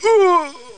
death; terror

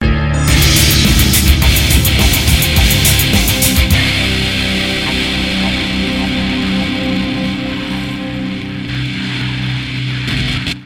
checkpoint; hlze

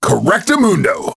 correct

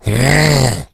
zombie alert; justzombies

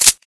revolvers wheelout

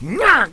grunt; kingpin

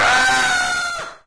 fx random; justmansion

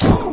rpg door; dn3d r

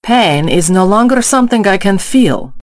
pain

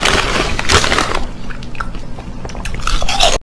chips

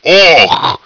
uhh; hgrunt

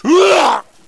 MALE DEATH; combatarms2

